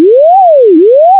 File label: chirp; chirps